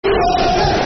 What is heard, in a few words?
Football Chant (Soccer Songs); Who Are Ya; Hartlepool United Hufc